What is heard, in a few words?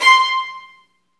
STR VN, Roland, STR Vls Marc; Roland L-CD702; Vlns Marc1-3